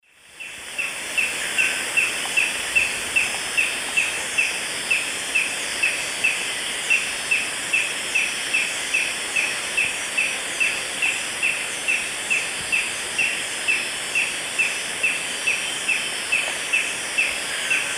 Galbula dea, call and song; S. America; Eastern Lowlands

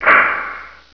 HIT, SYNTH

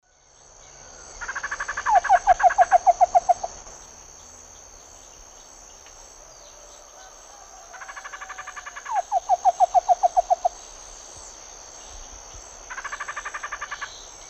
Hylopezus fulviventris, 2 song types; S. America; Eastern Lowlands

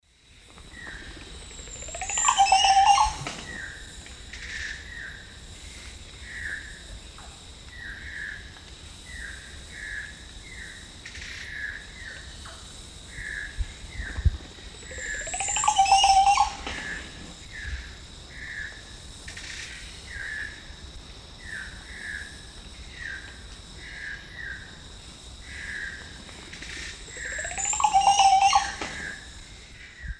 Psarocolius viridis, song; S. America; Eastern Lowlands

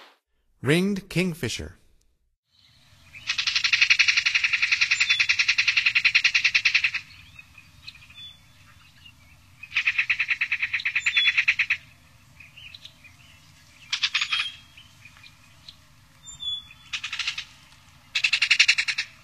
Ringed Kingfisher; North America; Stokes

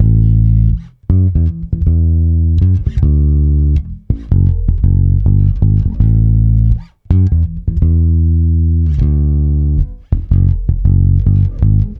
Bass A Funk

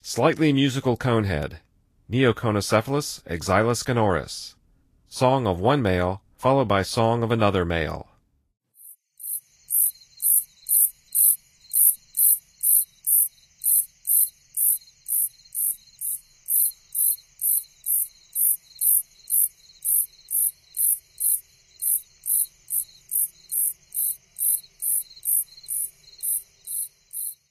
Slightly Musical Conehead, Songs of Insects; N. America

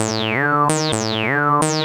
Synth, Techno

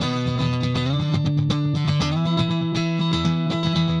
Slinky Melodic Gtr, Rock, Guitars

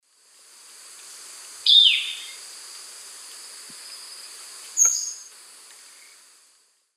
Pipra erythrocephala, call; S. America; Eastern Lowlands

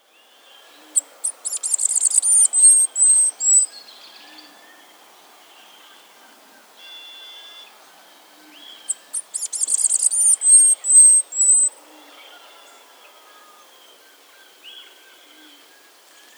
Masked Flower-Piercer, Diglossa, S. America

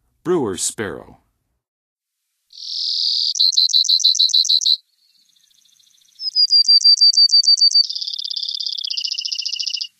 Brewers Sparrow, Songs of Birds of North America; Western USA; Western USA Songbirds

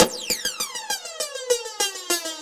Phased Beat; Electronica

